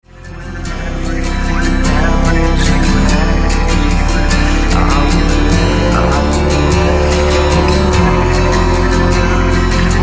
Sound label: instrumetal